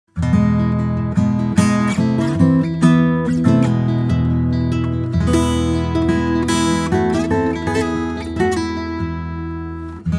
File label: Nana De Malaga karaoke